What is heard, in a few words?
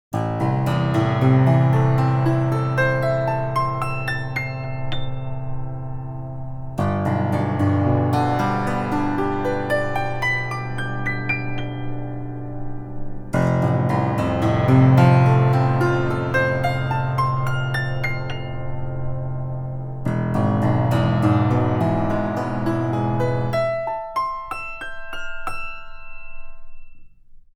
Clavecin